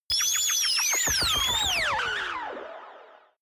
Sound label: small flying droid, spaceship; raumschiff